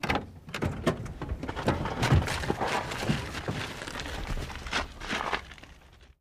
download Sound, Chevrolet Corvair, Interior Pov, Door Open, Quick Entry Or Exit with Shuffling And Seat Movement.